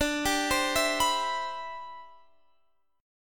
D 13th Suspended 4th D; D13sus4 arpeggiated

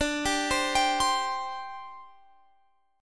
D 7th Suspended 4th

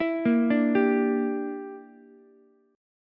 A sharp 6th Flat 5th, Ukulele; A#6b5 arpeggiated; JGuitar

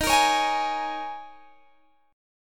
D sharp 7th Suspended 4th D sharp, Guitar Open G

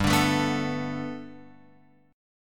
G Major Add 9th Guitar Open F, chord; x,2,2,4,2,4; Gadd9 strummed

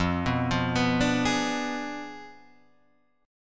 F Diminished 7th F down Guitar Half Step Down

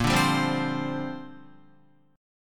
Bb 6th Add 9th Bb Guitar; Bb6add9 strummed

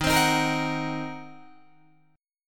Eb Minor 6th, Guitar DADGAD; Ebm6 strummed

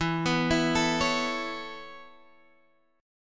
E 6th Suspended 4th; E64 Chord {X 7 9 9 10 9} arpeggiated; JGuitar

